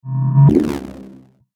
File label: Whoosh Digital Distortion Glitch; Blastwave; Whoosh Sound Effects